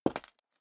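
step high heels concrete grit single right, footsteps; schritte; menschen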